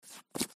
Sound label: card deal; new-years